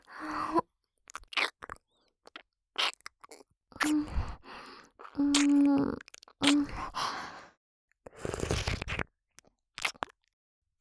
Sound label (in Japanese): 耳舐め音, ASMR